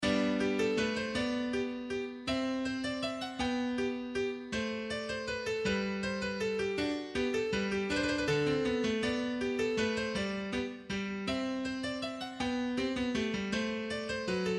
Minuet

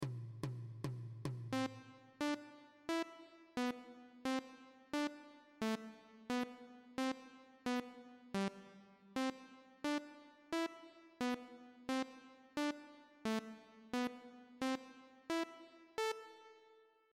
BELLS REMAKE

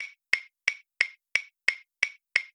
tick tock

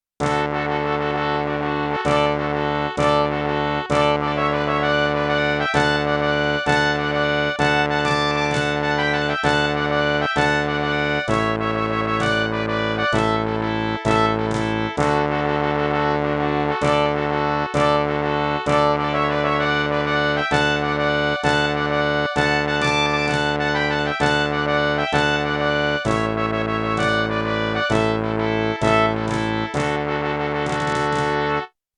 championship fanfare; jimpaterson

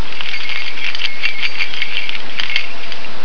my keys

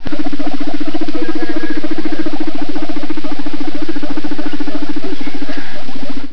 cavy

